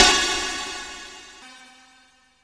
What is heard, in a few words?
spectra hit; Back In Time Records; Big Bang; SFX